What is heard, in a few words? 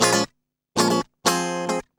120 BPM